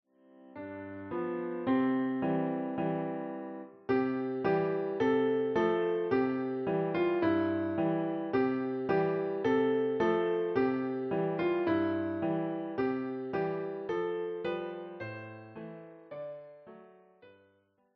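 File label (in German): We Shall Overcome, Klavier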